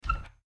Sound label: Closet Open; Granny 3; Android